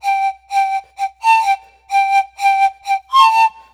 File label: Thai Pan Pipes; Ambient; Clean Flutes